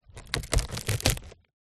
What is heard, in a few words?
wood board large stress break splinter; foley